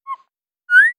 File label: Dilophosaur